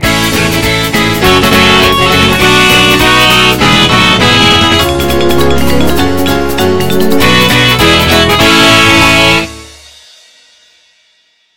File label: Game over Rock Ver; F1 Race Nes